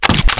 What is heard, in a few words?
Scorpion, Wavetables; Cymatics; Serum; Animals for Serum - Gold Edition